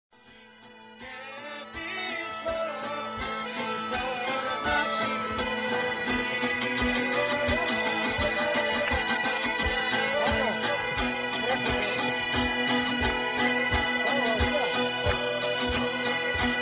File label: vroukounta dance, karpathos